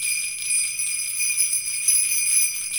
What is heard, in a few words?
INDIA PERC